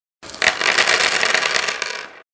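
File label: sound of scattering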